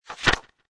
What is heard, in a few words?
button main page turn; croods